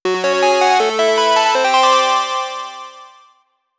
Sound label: Jingle - Getting Treasure; Sphere Knight